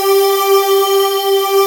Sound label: PADDING OUT, SYNTHESIZER; AKAI S6000 CD-ROM - Volume 1